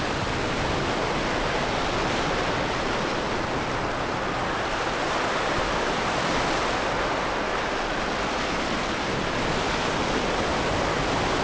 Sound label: water sea storm; Pirates Of The Caribbean